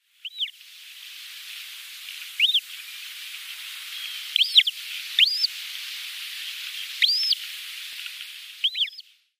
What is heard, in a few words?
Arctic Skua downy Young